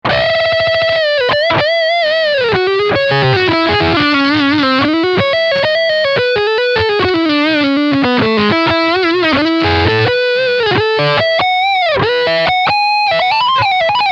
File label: Lick Front HB Distortion